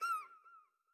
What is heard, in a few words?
Bat Wind Up